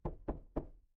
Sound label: door knock